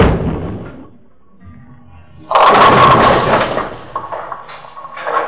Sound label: Pin Action; Mad Money; TIPro